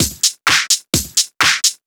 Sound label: Straight Loop 128 BPM, House